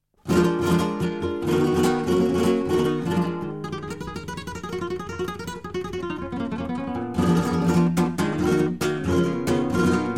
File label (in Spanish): bulerias; expoflamenco